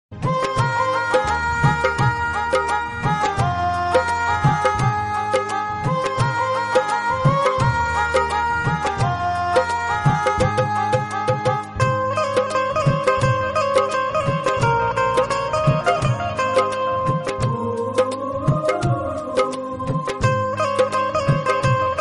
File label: instrumental ringtone romantic ringtone